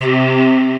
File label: Breath; instrumente